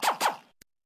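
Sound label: ui button enter tyre booth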